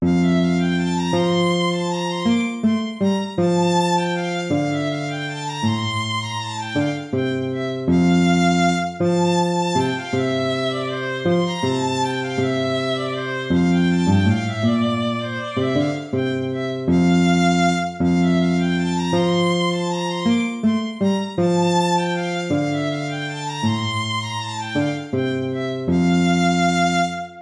piece for lute

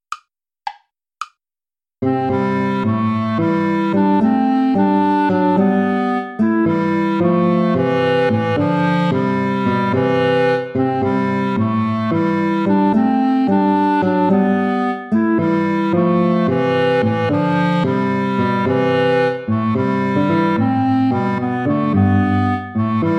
play along without clarinet; hassler hans johann leo; ein feste burg ist unser gott

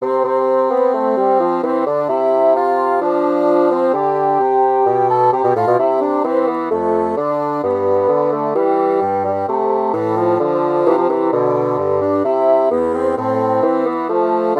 march in c major for bassoon duet; purcell henry

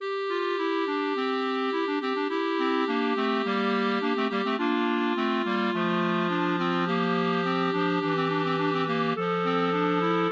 clarinet trio; Buxtehude, Dieterich; Jesus Christus unser Heiland

